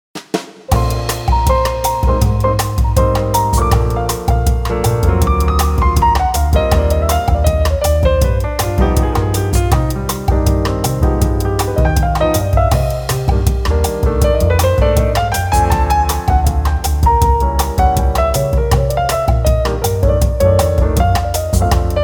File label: Restaurant BGM; Ojamajo Doremi Movie; Eiga “Majo Minarai wo Sagashite” Music Collection